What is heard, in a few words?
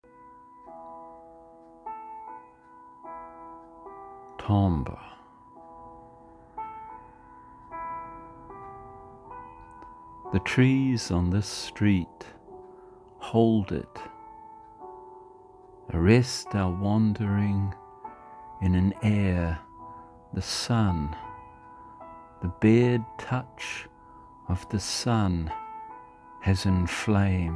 timbre